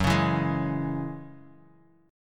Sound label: F sharp 7th Suspended 2nd; 2,4,2,1,x,x; strummed